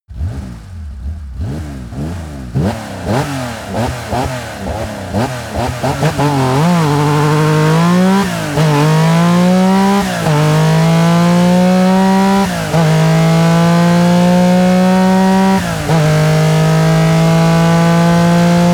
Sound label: car porsche 959 rev accelerate decelerate